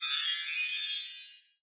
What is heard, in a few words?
Success; keplers laws